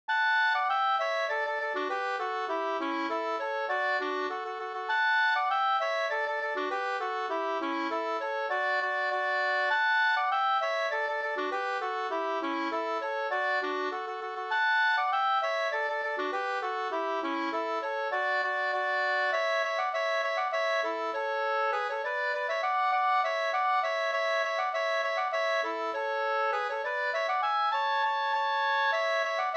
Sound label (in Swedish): polka polkett